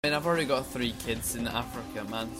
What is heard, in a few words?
kids